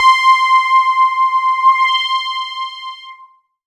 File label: C5, Synth Line; NiceBeats